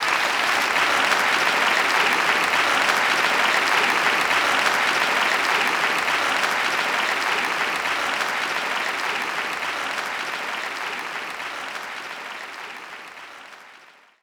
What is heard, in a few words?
Claps; blinx the time sweeper; xbox